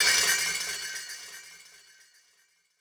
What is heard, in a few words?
Perc Metallic Clatter, One Shots, Drums, Misc Percussion